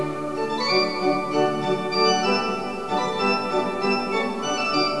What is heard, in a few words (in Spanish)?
organo